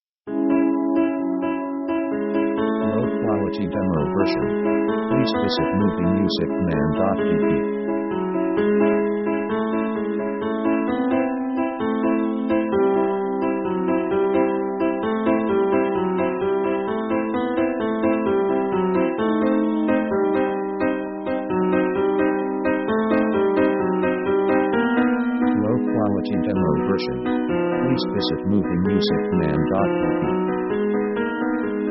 pop destiny progress; synth themes

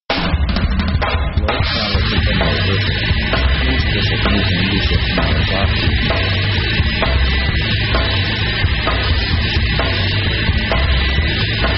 metal crunch 130bpm

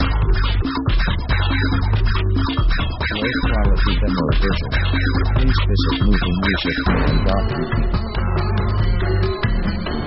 action break

